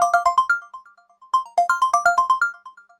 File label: ringtone; chatwoot; dashboard